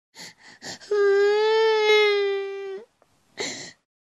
Baby crying hoarse breathing

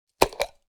Glass medicine jar lid opening

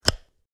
Electrical plug insert click plug in

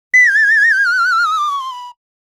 Cartoon falling down whistle

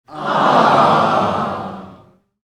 Crowd aww sitcom reaction

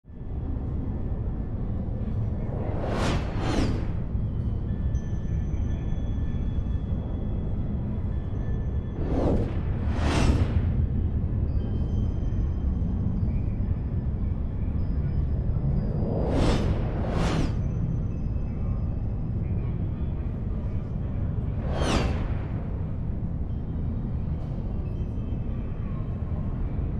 Sci-fi soundscape loopable futuristic city

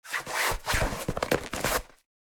Inflatable armbands removing

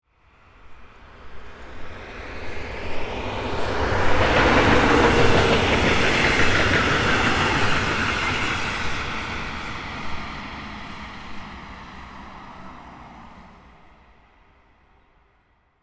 Train approaching and passing by; vehicle

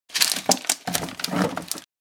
Fishing tackle box

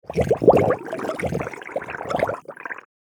Deep underwater bubbles

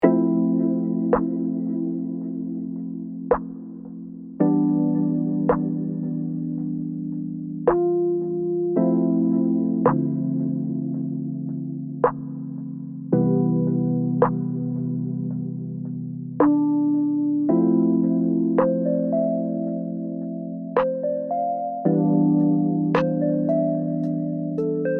Chill beat driftin shadows

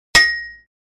Metal impact thump

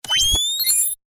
Night vision goggles power switch on off; Military Equipment

